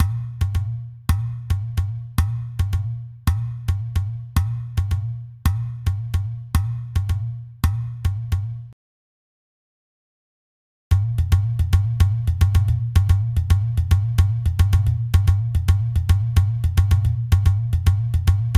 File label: Clay Drums 110 BPM; перкуссии percussion loops